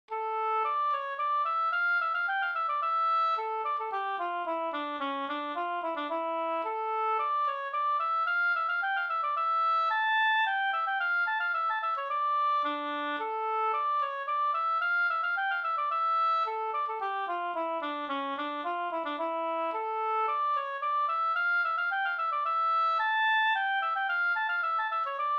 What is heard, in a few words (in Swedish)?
slangpolska